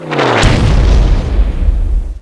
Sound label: artillery hit